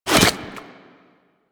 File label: Infested Fishing Spear Line Retract Catch; Warframe